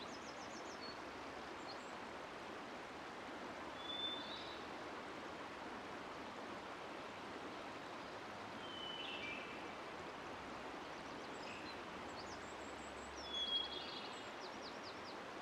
forest river loop, ambiences; Michael Ghelfi; RPG Ambiences Vol. 8 (Short loops)

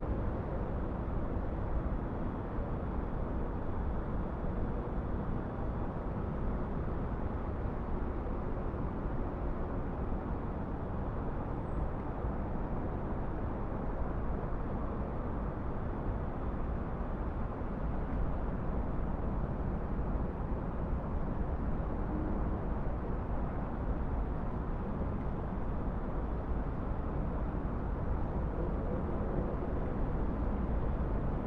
ambience city rumble large city light wind debris; Sonniss GDC

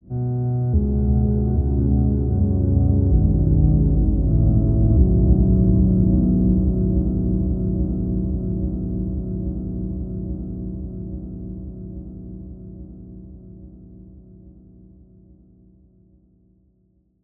musical element crime scene calm; sonniss gdc

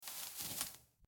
footsteps grass; weather and nature

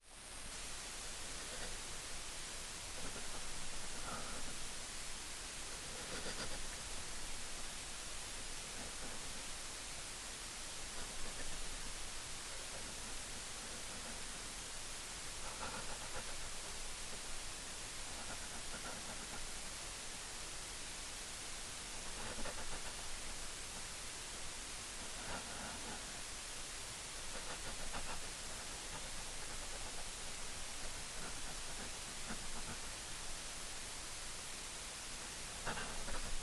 breathe male fast shuddering

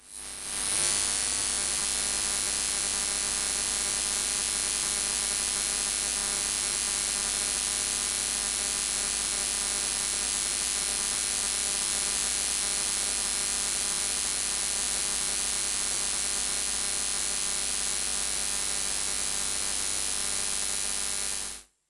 lamps source halogen light bulb

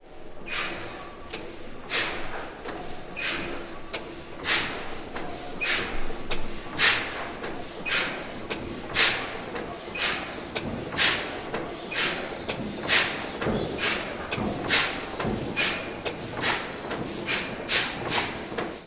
snow engine 600 hp starting up motor gas natural; coolspring power museum; sonniss gdc

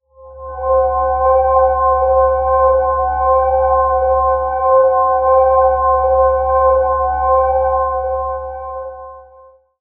light, cantrips

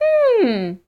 voice fun small character emote interested; sonniss gdc

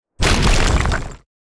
burrow breach, creature movement; psfx

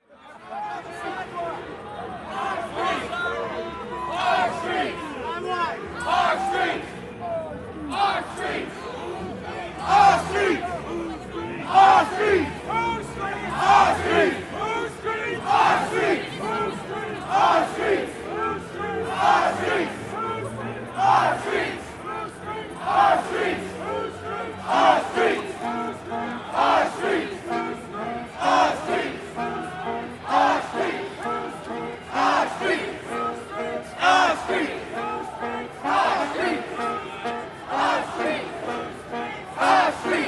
protests our streets downtown; sonniss gdc

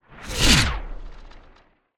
transition simple; sonniss gdc

